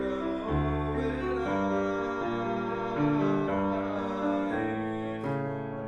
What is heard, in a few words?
Room L